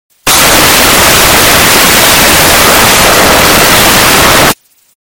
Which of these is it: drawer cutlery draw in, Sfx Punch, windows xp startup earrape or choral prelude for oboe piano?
windows xp startup earrape